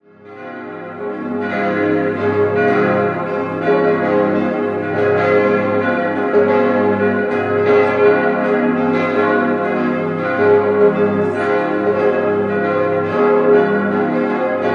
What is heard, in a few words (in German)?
Andechs, Glocken, Klosterkirche; Klosterkirche St. Nikolaus und St. Elisabeth; im Jahr 1669 in München gegossen; 2007; Bernhard Ernst; Rudolf Perner